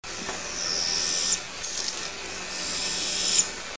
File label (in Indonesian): suara burung hantu serak hitam tyto tenebricosa